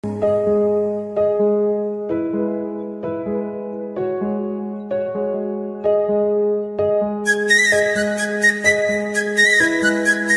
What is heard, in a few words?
kannada romantic bgm ringtone